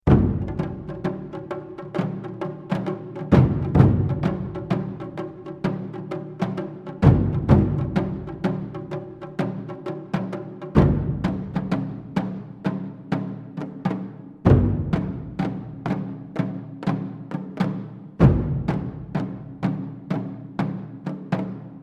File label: Epic Drums